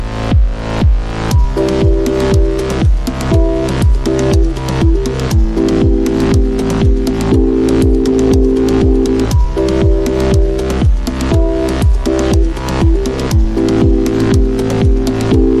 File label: Tunic Apple ringtone; Android